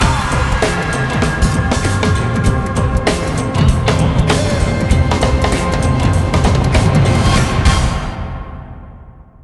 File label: Victory; NBA Live 2002; PlayStation 2